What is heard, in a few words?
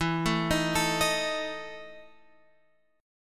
E Major 7th Suspended 4th, Guitar, Open F; x,11,9,10,12,10; arpeggiated